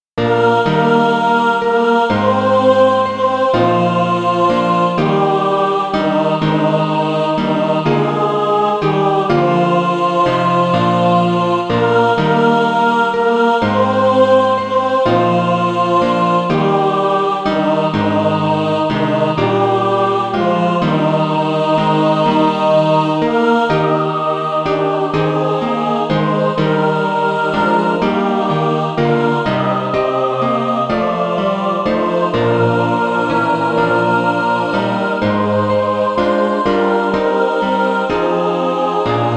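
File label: mixed, Sheet music (SATB) including Practise Music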